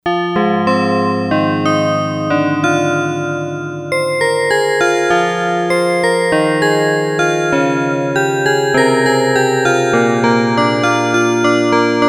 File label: Pianobells, Yamaha DX100; Factory Patches